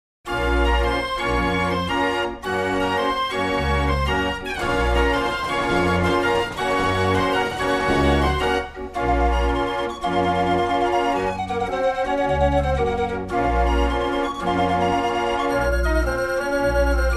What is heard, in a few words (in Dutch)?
draaiorgel